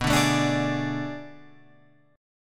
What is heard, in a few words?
B Minor 13th B down Guitar Open F; Bm13 strummed; JGuitar